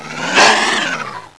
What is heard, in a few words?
gonome jump attack